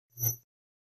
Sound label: Button Sci Fi Beep T, buttons & beeps